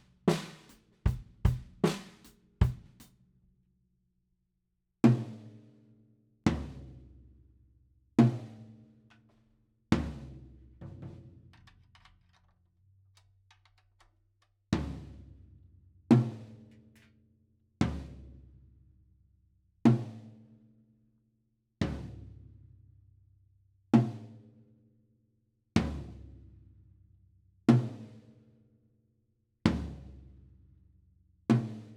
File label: KIT